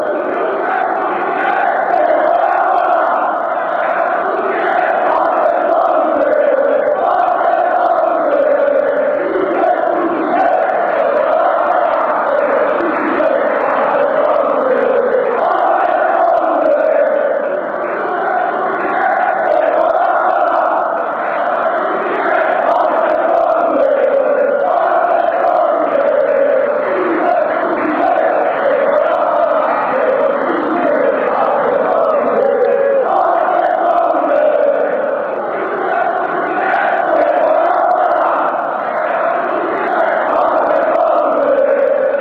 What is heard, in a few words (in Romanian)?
cant pentru tine, fanchants